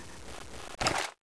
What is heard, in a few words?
m202a1 draw, weapons